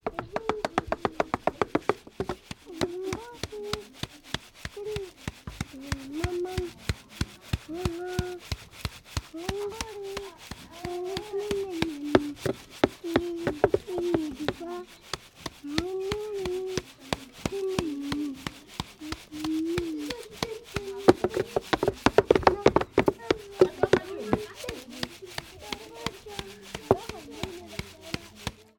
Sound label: household girl peul filtering millet singing africa; felix blume